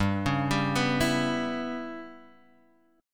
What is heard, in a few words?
C sharp Minor 7th Flat 5th G